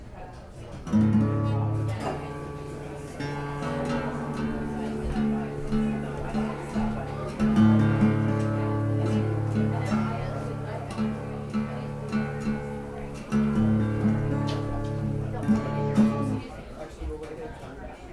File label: tuning